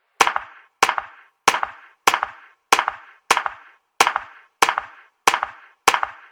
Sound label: clapping; left4dead2; kodua; fortnite emotes